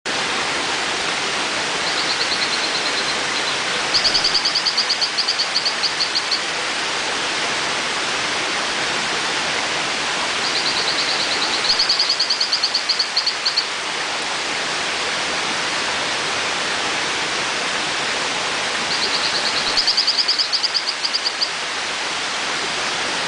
Hylodes phyllodes

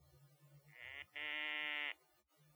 Xenopus parafraseri

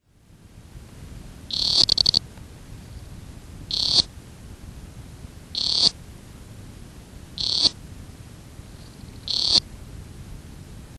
Blommersia domerguei

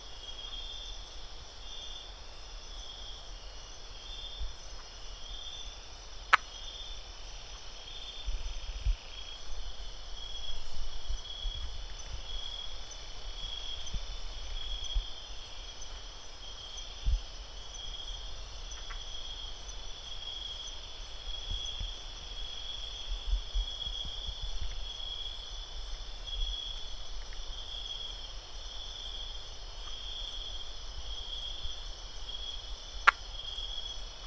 Haddadus binotatus

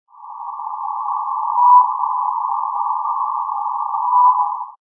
Plethodontohyla notosticta

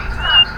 Arcovomer passarellii

